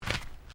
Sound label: Footsteps Ceramic Tiling Step; Crocs; Footstep Foley; Footsteps (Sound Effects:Human)